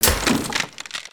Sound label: Garden Tools Smash; Pet Rock; destructibles